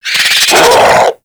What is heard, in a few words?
Infested Charger Alert, Enemies; Warframe